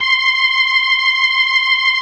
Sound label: C6F, B3